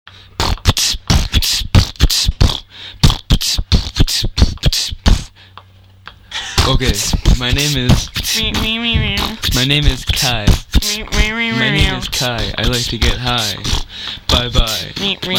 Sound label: Guitar on the beach